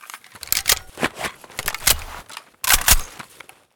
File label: reload empty, weapons